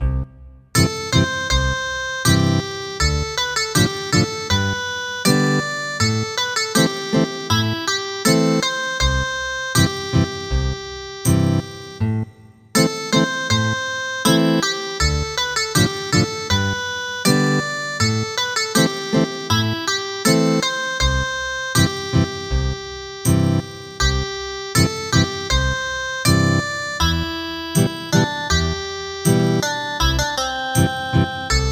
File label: Ethnic Guitar; Cha puk